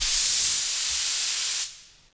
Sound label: airhose; push